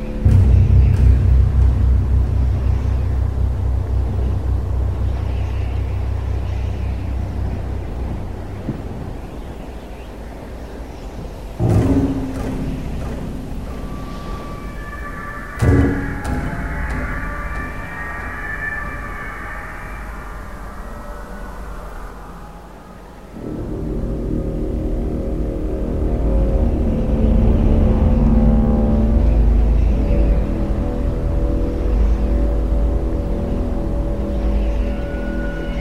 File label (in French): Ambiance - Musique Ext; bet on soldier blackout saigon